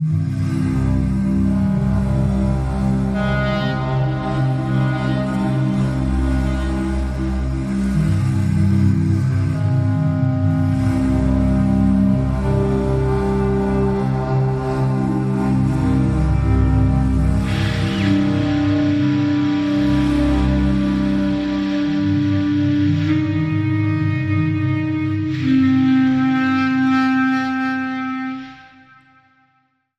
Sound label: Foggy Wood Winds